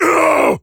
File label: pain major; Duke Nukem; Forever 2001